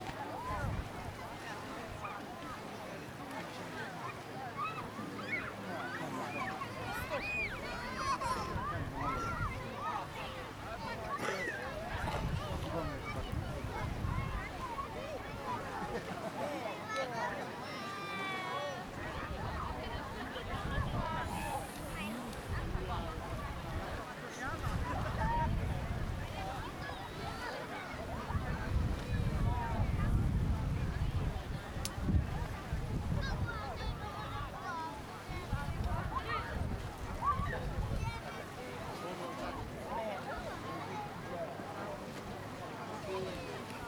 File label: Beach in Summer; SFX; IRL Recorded